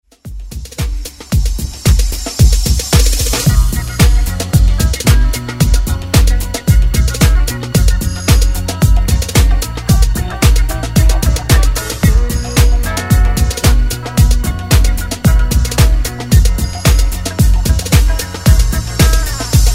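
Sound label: 70s Extended Redrum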